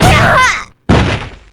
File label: dynamic; weiss